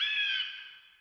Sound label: Next Wave Ready